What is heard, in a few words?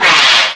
zombie attack